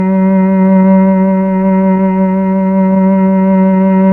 STR, Melo. Cello, Slow Cello; Roland LCDP09 Keys of the 60s and 70s 1

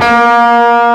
A#, GUITAR; AKAI S-Series CD-ROM Sound Library VOL-8

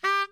BARI FF G 3, Sax; Giga Samples Collection; BARI STEREO

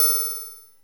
Blip Perc, Drum Machines

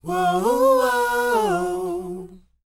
WHOA F#, Vocal R&B & Dance; ILIO; Vocal Planet

